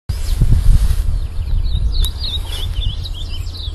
Geothlypis aequinoctialis; Entre Rios

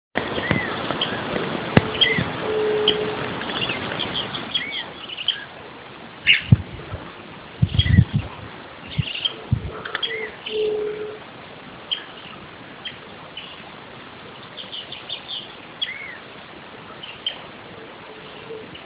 Elaenia mesoleuca

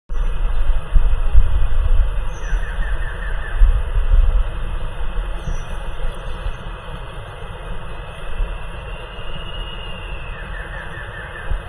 Thamnophilus caerulescens; PP Salto Encantado

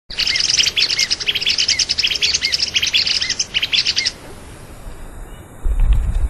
Stigmatura budytoides; Parque Luro; Jorge La Grotteria

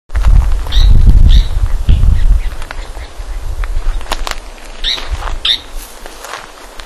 Rhinocrypta lanceolata alarma; PP Chancani

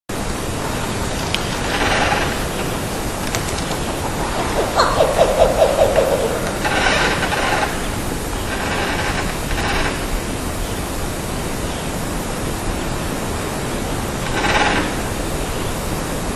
Strix rufipes; Llao Llao; Jorge La Grotteria

